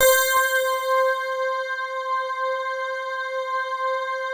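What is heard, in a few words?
PAD, atmospheric pads; Best Service; XX Large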